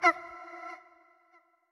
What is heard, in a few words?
Toxic Mask Hurt; Going Under